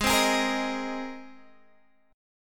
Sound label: G sharp Major Add 9th, Guitar, Standard; strummed; 16,15,18,15,16,x